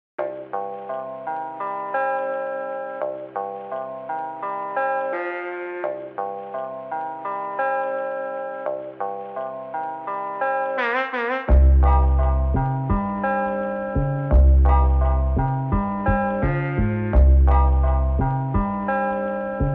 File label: Song